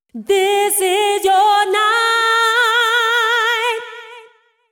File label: deep house female, 125, A, vocals